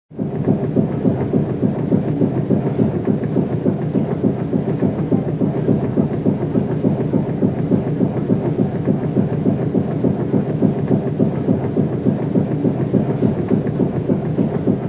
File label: Ship engine room; boats ships; boats ships canoe rowing